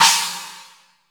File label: CHINA, CYMBALS